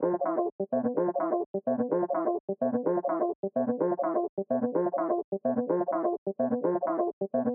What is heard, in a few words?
build up reprise speed